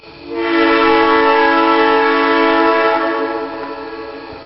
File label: horn